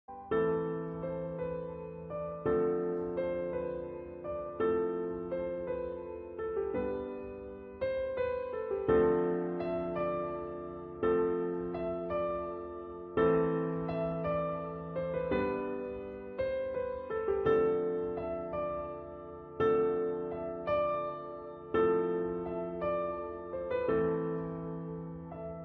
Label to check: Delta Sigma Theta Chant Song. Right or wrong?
Wrong